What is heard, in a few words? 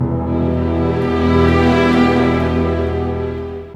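60 dark, orchestra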